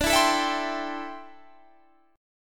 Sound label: D 7th Suspended 2nd Suspended 4th D; D7sus2sus4; strummed; 22,19,19,21,20,20